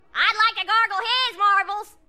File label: taunt; taunts; stompinggrounds; props